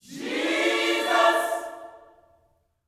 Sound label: JESUS, Gospel; Spectrasonics; Vocal Planet; GOSP CHORD